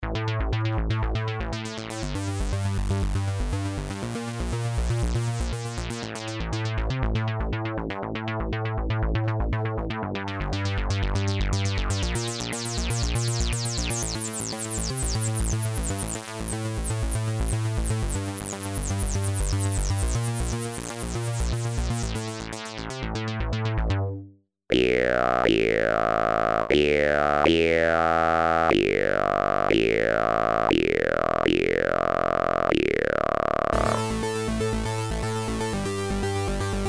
Bass

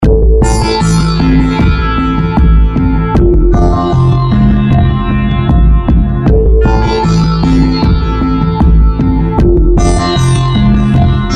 Rhythm w instruments

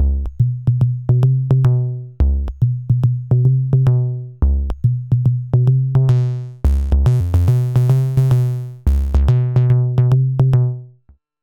Hollow Bass; Reon RE501 RX Driftbox

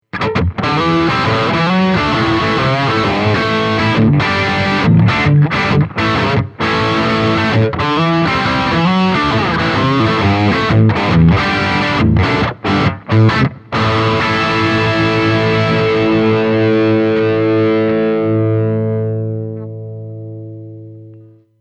Heavy Drive

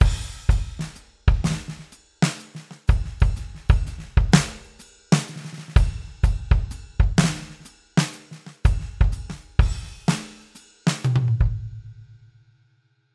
Drums Groove 124 Jazz Straight; Steven Slate Drums 5

